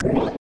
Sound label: powerup short